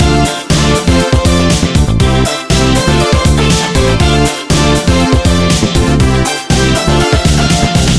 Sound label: BGM sand speaker; cookierun